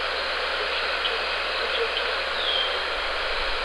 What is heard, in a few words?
keys